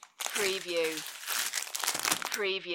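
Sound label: Plastic Wrapper Crinkle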